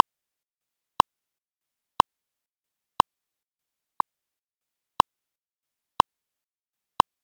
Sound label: bpm60 48k